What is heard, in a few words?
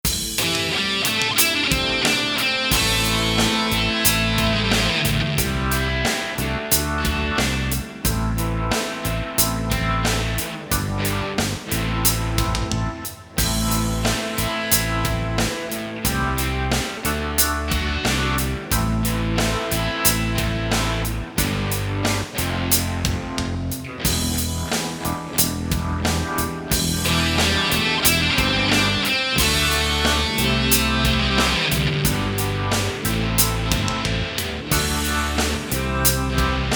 rock playback